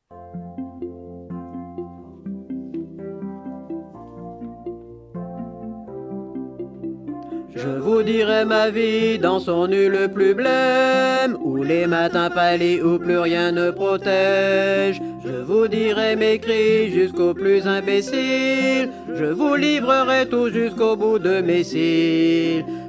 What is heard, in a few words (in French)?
TENOR